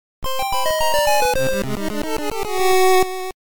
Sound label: Faint & Magical Encounter; HPCoS; GBC